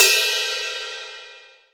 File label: Cymbals Normal